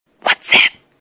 Funny Ringtones

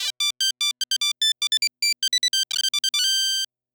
Light Crackle

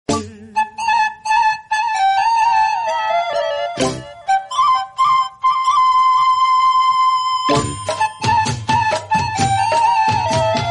Flute Humming BGM

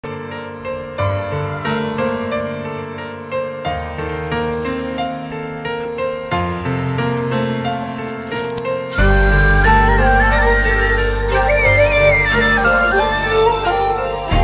Flute Ringtone Instrumental Ringtone

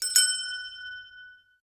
Bicycle Bell

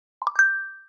Popcorn Notification sound; Google Original

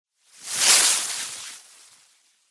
Electric Transition Whoosh Long

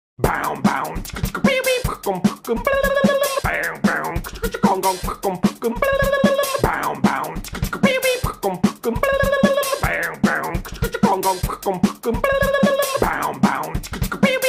Electro Bam Bam

